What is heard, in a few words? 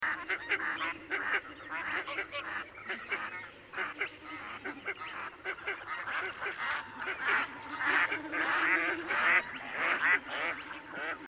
Northern Shoveler, Spatula clypeata, waterfowl